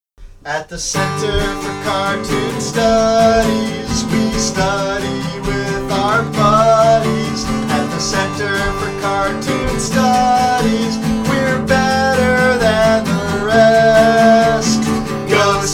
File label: fight song